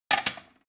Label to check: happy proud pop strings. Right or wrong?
Wrong